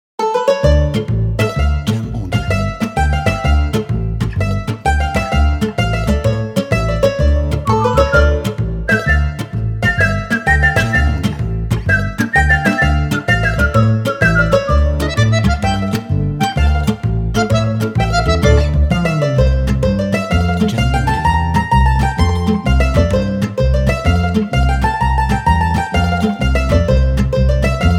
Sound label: Italian Tarantella